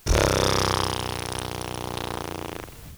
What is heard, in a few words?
noise, vocal stretches